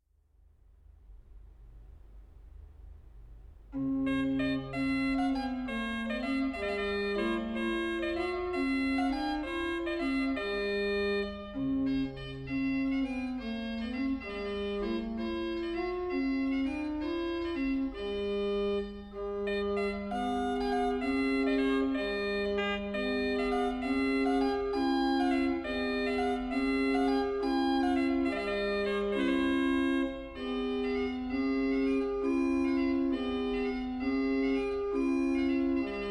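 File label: Cancion de clarin, organ; Caimari; Mallorca; Anonymous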